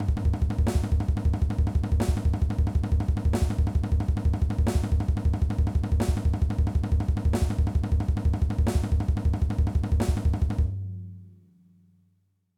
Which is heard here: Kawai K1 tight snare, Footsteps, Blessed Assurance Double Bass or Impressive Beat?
Impressive Beat